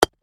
Ice Block Hammer, Hard; Impact; Miscellaneous Impact; BlastWave

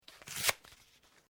Cardboard tear; Material components for constructing more complex sounds

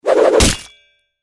Sword Throw Impact Human Body; Warfare; Blastwave

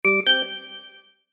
Chime Musical, Chime Musical Multimedia

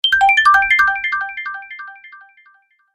Gaming Award Sparkle Bonus Transition, video game

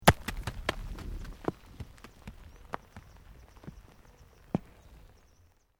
ROCK TUMBLING

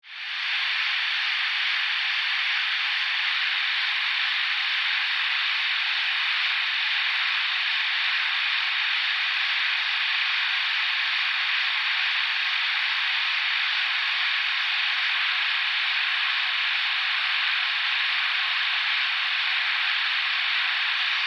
Scifi, Alien, Locust, Swarm, Science, Fiction; Aliens

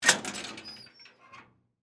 Locker Open, Sports, Locker, Door, Open; Metal, Squeak, Gate; Miscellaneous Sport Sound Effects

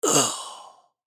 Die Last Breathe Element Male 25 Yrs; Male 25 Year Old; Human; Blastwave

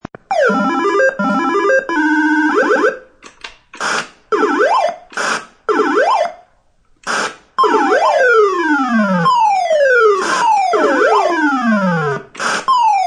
Playing Galaxia video game.; Games

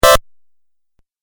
Beeps Video Game

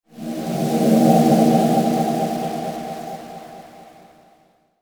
Futuristic Air Hiss; science fiction sound effect

